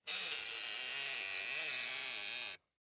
Metal Handle Turn, Metal Handle Turn Knob Slow Squeak; Foley; Metal Doors; Blastwave